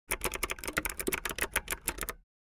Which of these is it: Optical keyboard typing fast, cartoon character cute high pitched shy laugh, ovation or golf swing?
Optical keyboard typing fast